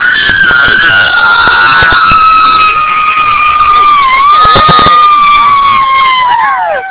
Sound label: scream ahhhhhhh